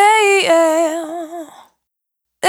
Urban Dry, Single Voice Melodic Loops; Pocket Diva World Class Vocal Samples

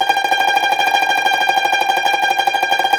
CUMBUS Tremolo

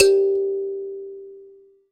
G, Thumb Piano; Best Service ProSamples vol.36 - Chillout

